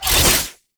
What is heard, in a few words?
Whoosh, weapons; Broken Frame Warfan; Quassus Prime